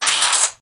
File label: hagar load, weapons